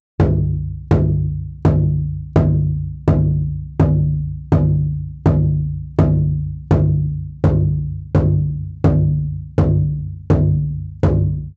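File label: Big Drum sheepskin mallet; Little One (Teetich)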